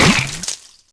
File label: snot; shadman; baddys